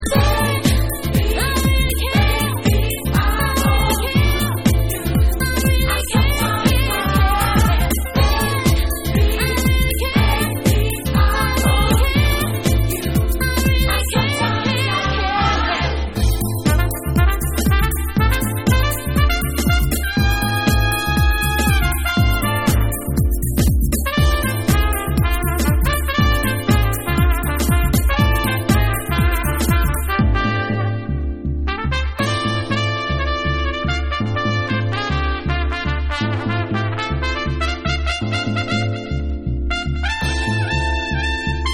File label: Vocal Mix